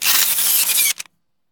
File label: bonus spraypaint; monsterplex